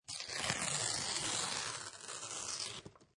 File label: Paper Rip, Ripping paper, long paper rip.; Classroom; Schools